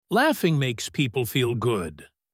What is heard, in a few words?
Laugh